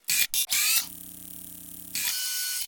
servo ice lense, Robotic Lifeforms; SoundMorph

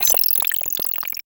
Scanning, Sci-Fi Systems; David Dumais Audio

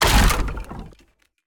Designed Wood Crash And Debris; DavidDumais; Explosion SFX Pack

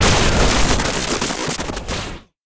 Over Heating, Futuristic Guns; David Dumais Audio; Futuristic Guns Sound FX Pack 3

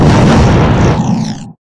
particle storm dissipate; hl2

